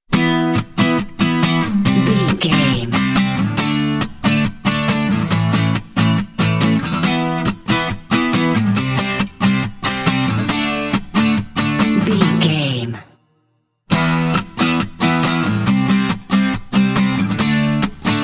indie acoustic gtrs only

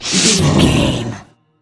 troll monster breath growl

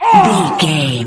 voice male battle shout short

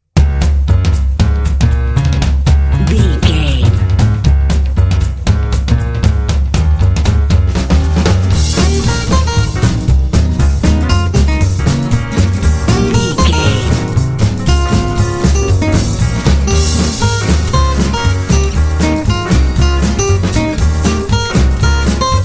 jitterbug swingers

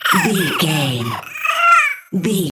goblin fairy death intense

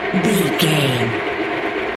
elevator loop

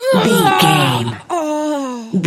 voice female death

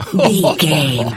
voice male laugh

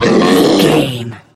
troll monster taunt

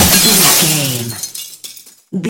glass smashable large break

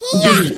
voice female attack

